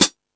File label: High Hat, Drum Machines